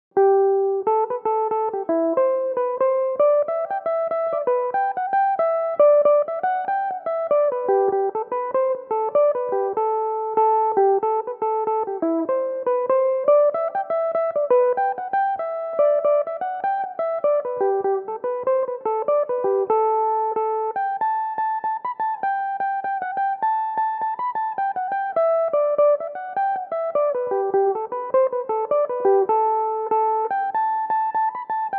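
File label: jig A dorian, Irish mandolin; My Love in the Morning; Belmuse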